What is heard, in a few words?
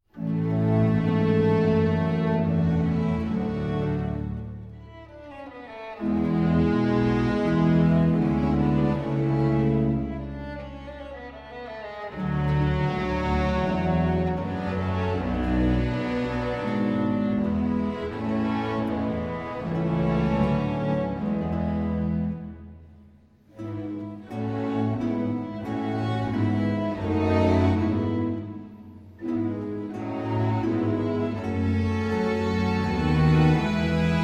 Orchestra, Concerto Grosso in B-Flat Major; Op. 6, No. 7